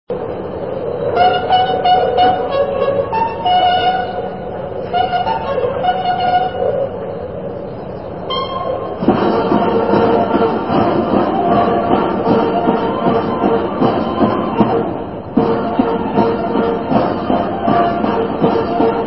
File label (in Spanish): Marcha de paso ligero, TOQUES DE CORNETA; INMEMORIAL